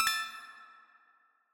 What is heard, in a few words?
Star Buy; TouchyTickets